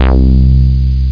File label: synth bass C1; Flashback Demo